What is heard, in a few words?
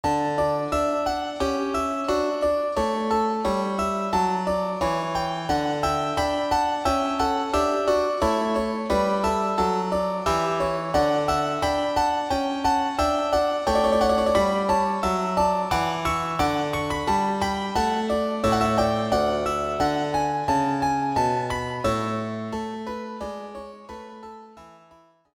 Harpsichord